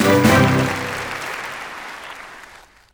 gui player promotion; Rescue 2013 Everyday Heroes

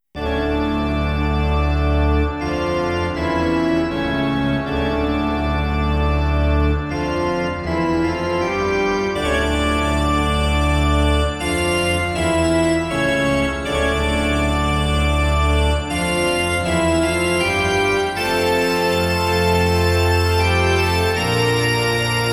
Original Keyboards Instrumental; Sublime II

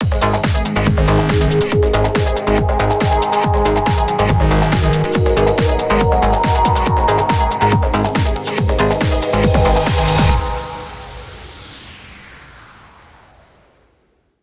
Start Elec; Gran Turismo 5

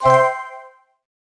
Correct; lego my style kindergarden

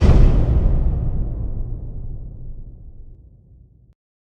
Musical Hit

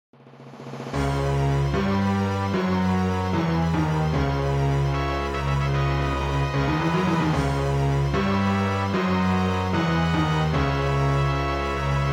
Negative; Star Merc

